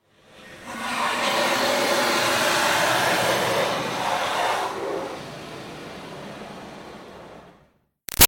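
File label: Water Spray Interior Car By Soft Long; Car Wash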